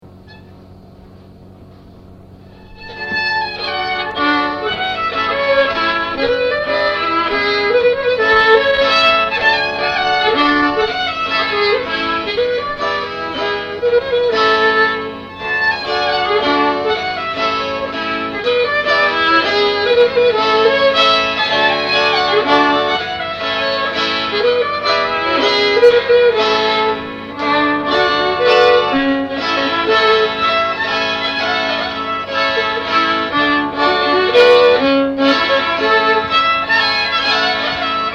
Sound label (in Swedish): Polska